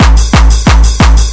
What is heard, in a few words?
180 clap